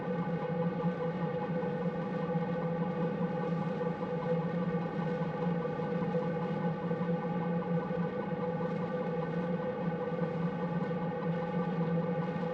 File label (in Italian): burst 16ms; Da Sorg2 a Mic3-4; LaSpezia; CESI